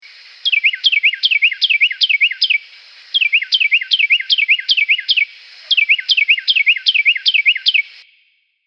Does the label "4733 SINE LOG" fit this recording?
No